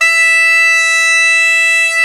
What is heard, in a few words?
CHANTER E4; World Combo